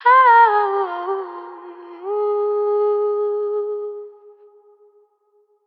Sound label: Ad Lib Wet, F Min, Vocals; Cymatics - Eternity Sample Pack; Ad Libs